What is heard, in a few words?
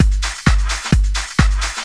drum 130 dance, drumloops; Techno Trance Essentials